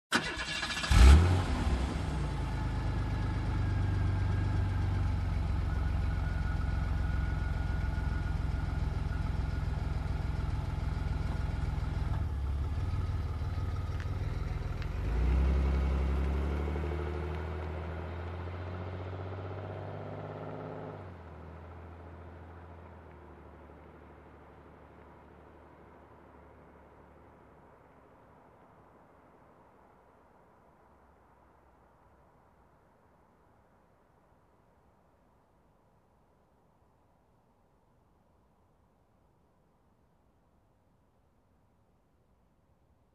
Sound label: SUV engine ignition and driving away